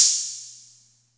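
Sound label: Atlantex Music Percussion Computer hi-hat open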